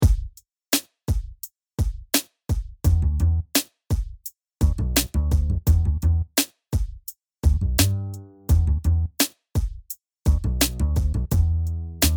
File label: Beat; civics-rap; consumers